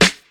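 natural snare drum f sharp key, acoustic snare tuned to the F# note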